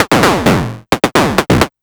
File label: Drums Strange